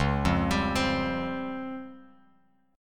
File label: Db Major Sharp 11th Db Bass Standard; arpeggiated; JGuitar